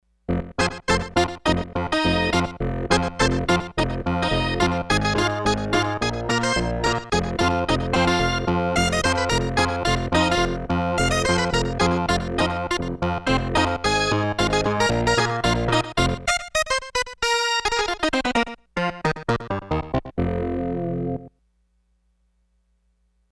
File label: Clav Organ Lead, Roland D-10; Factory Patches